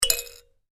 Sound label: toy musical shaker; Miscellaneous Toy; Toys; McKinney Sound